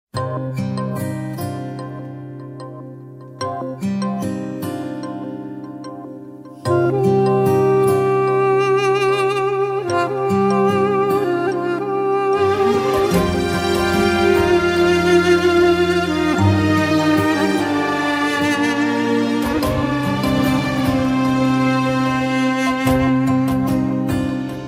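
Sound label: beautiful calm music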